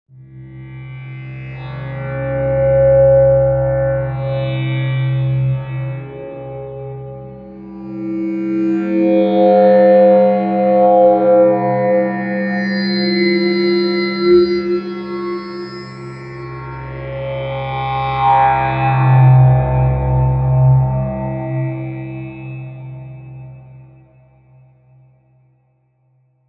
Dawesome KULT; AMBIVOLENCE

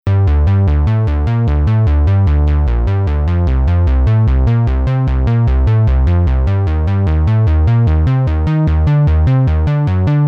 iSEM Simple Preset

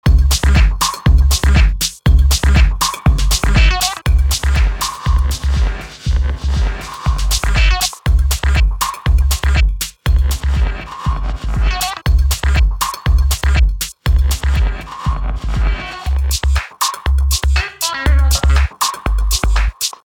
Reverb

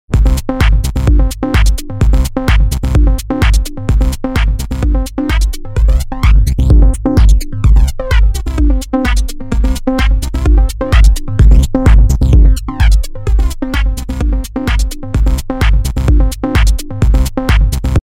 Loop Flanger

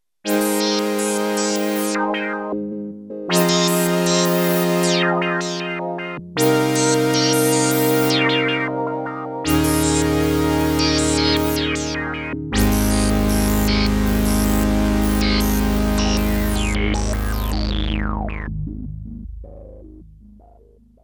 P600 Pan Reso Pad

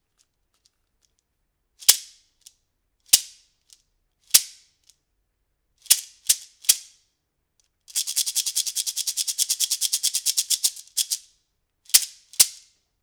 Shekere; UAD Sphere

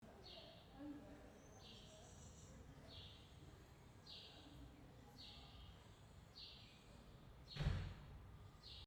Zoom H6 WINDSCREEN Internal Mics Outside

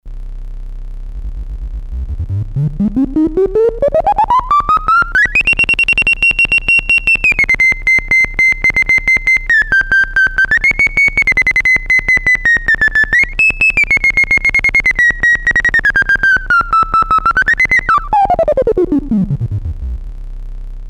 Roland JP08 Birdy

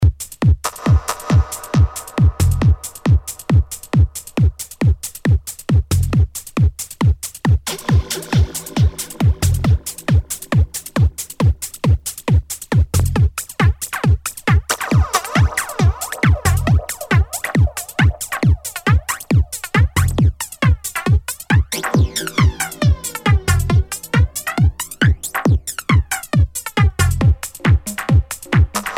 Flanger

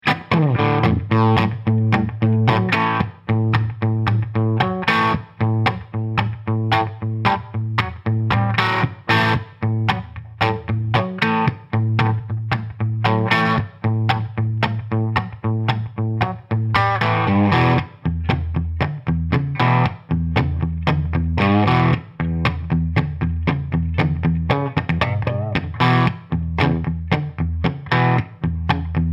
Gibson Les Paul Marshall Celestion G12150 MS57

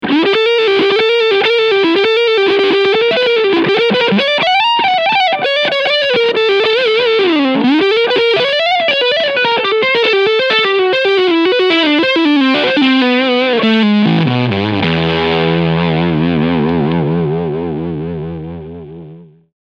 Full Gain High Cut

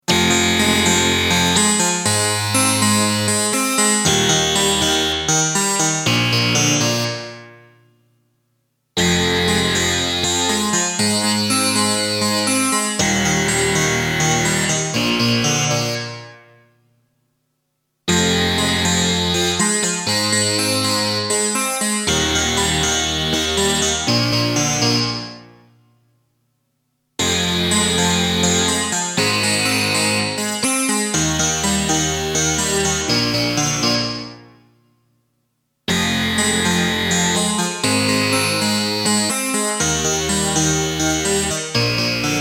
Dimension D OB 8 Doublesound

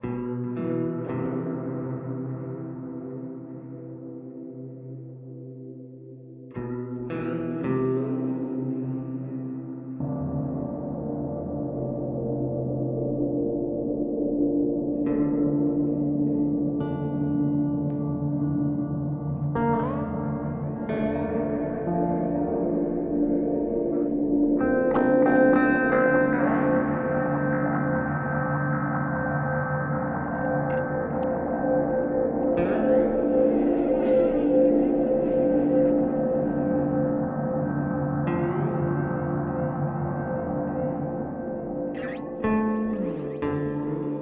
Meris Polymoon Feedback Flanger

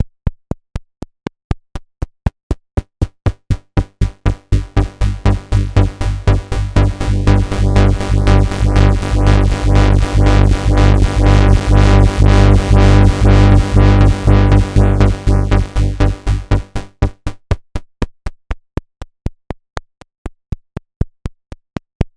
Oberheim SEM VCA Decay; G-Force Software

